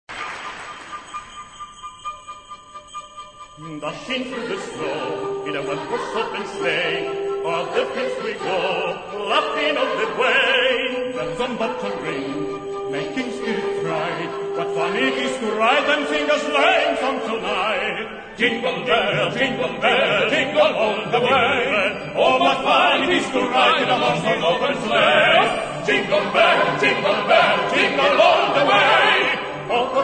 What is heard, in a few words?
jingle bells; homebase recording studio